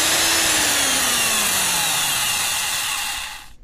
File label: Drill End